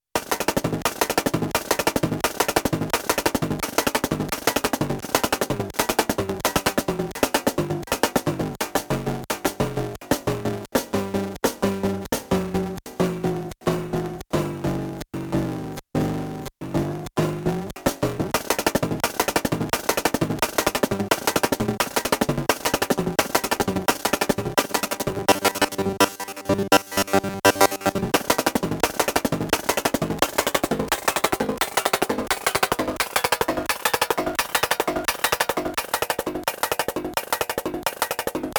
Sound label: Drumbeat; Sonicware ELZ 1; SiGRINDER